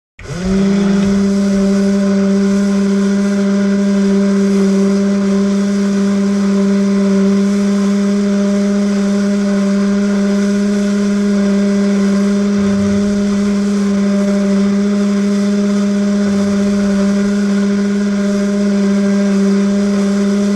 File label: Electric Motor Large